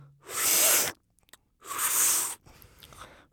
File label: A Person Sucking Something